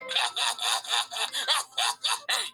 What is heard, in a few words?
heh heh heh heh heh heh hey